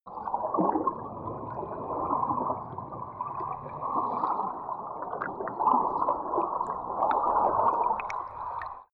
canal

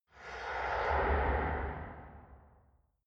metal soft

